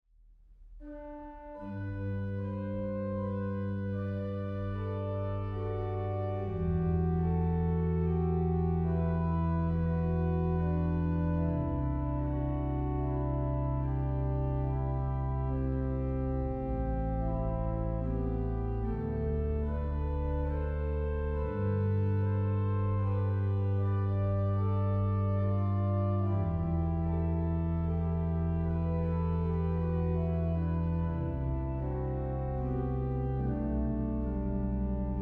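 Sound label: meditation, organist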